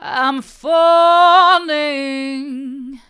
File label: i'm falling hard, vocal